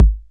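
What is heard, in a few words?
bass drum 808 Sat Click Decay A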